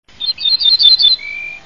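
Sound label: ortolan bunting voice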